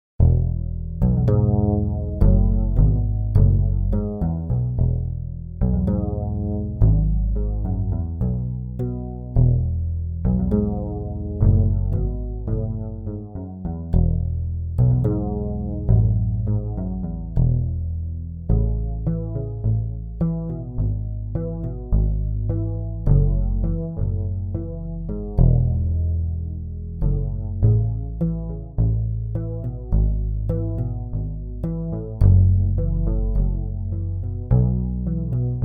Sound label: Bass Soli